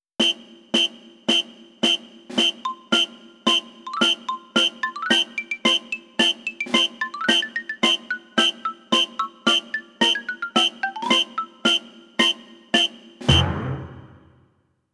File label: Marching Parade Marching; wii fit plus platinum soundtrack 2011